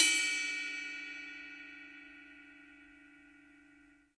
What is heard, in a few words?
CYM RIDE, DRUMS SINGLE HITS